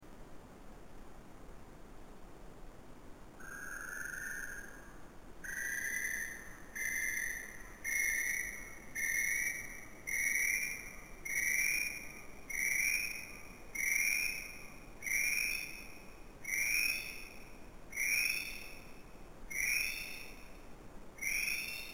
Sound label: kiwi; Trap